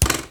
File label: arrow hit; minetest; x bows